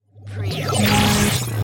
Glitch; Sound Effects Bible

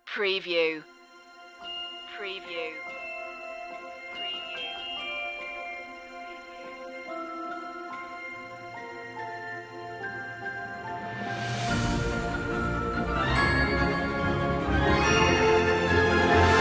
fantastique opening no choir

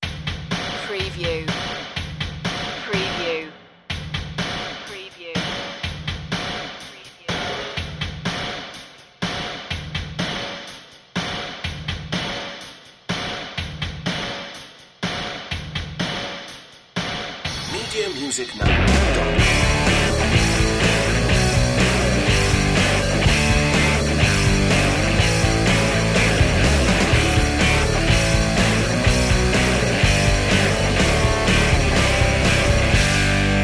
garage band underscore

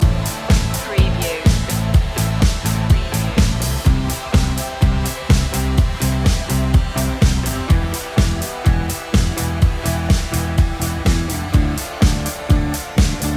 night driving loop

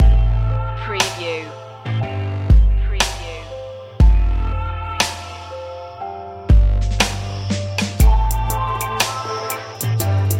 dark fashion

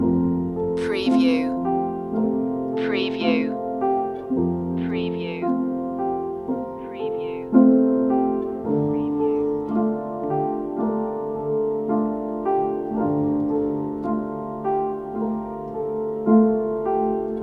snow flakes loop

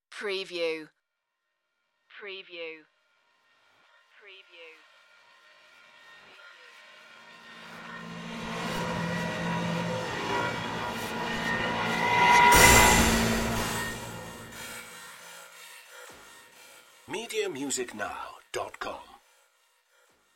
abstract passing mystery

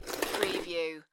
Snow and Ice, Ice skate slide; Weather sound effects

